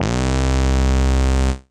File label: Dub, Analog Bass; Dub Dr; Ableton; Factory Packs; Retro Synths; Analog Bass Vol 1